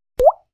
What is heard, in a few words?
Water Drop